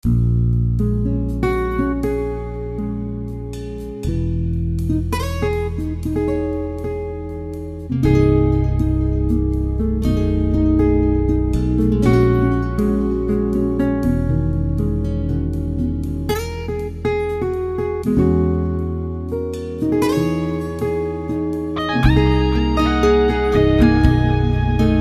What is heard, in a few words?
guitars; spectrasonics